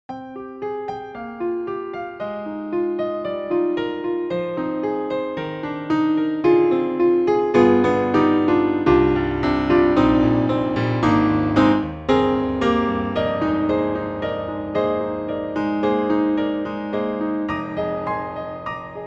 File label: Italian Grand Recital; Synthogy; Ivory II